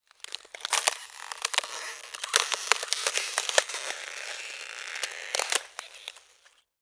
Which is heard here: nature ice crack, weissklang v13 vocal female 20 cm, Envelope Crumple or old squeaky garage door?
nature ice crack